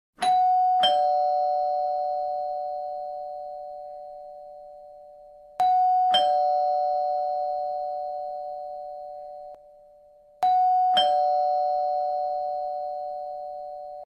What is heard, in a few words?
Doorbell Classic